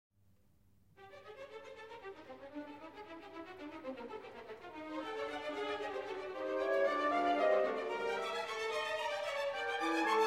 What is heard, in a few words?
Symphony Orchestra; Leornard Bernstein BBC